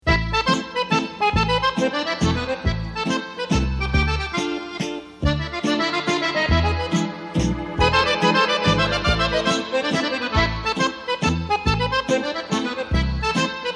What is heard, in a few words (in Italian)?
Mazurka